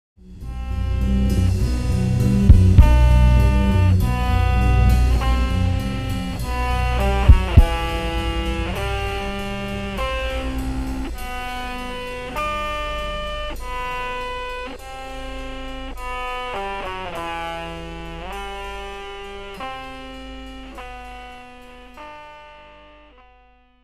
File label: Microsynth; ELH GMS